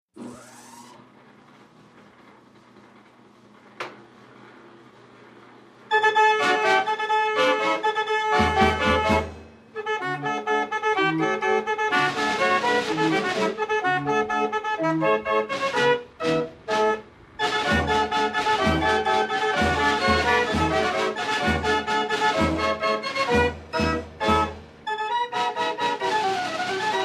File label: Calliope Switch On